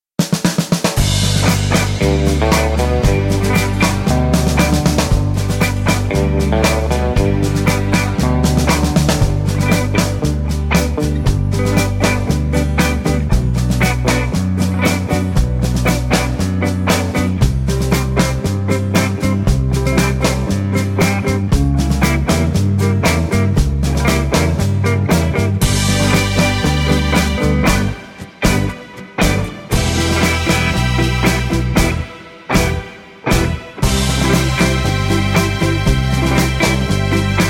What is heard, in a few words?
(G) No Backing Vocals